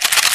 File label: reload